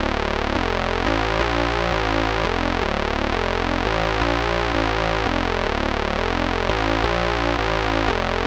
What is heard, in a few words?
DC Run Bass, Drum n Bass; KJ Sawka; Drum n Bass & Beyond